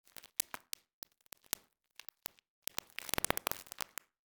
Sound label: Electric Static Sparks; Hollywood Edge; sound designer's toolkit v2